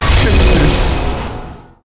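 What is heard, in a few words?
spawn; Aileen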